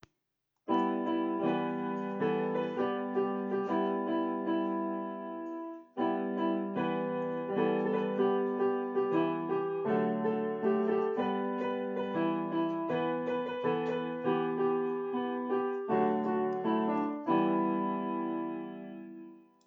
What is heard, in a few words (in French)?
Un merci à chanter (couplet instrumental)